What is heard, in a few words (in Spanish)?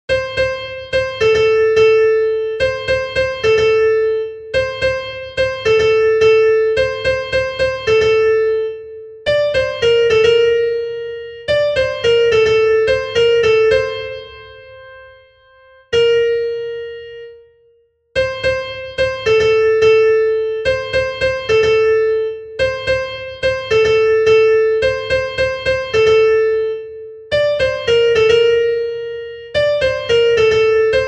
SOPRANO, himnos en piano; cantos del camino